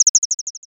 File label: sparrow